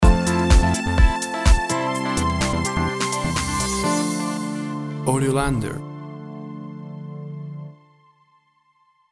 Warm Groove House Stinger

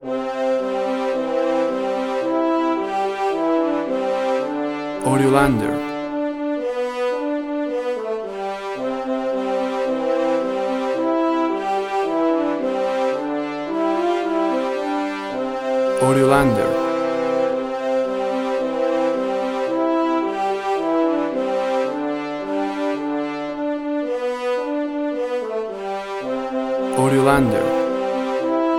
10 Little Indians Brass

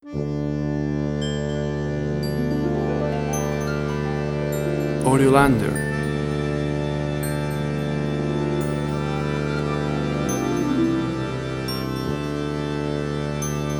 intro comedy

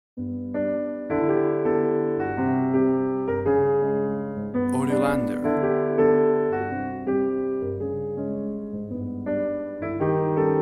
Jazz Music Club

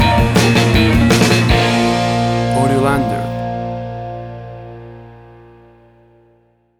50s Prom Band Stinger

